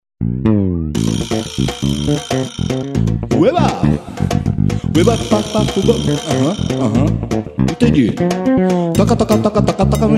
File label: instrumental funky rock jazz